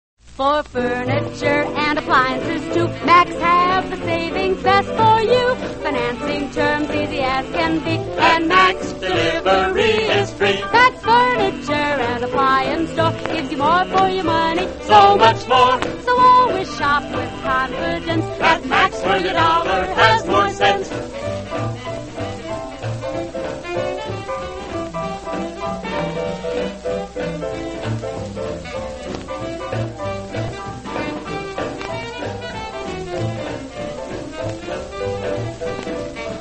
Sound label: radio jingles; macks; allied radio artists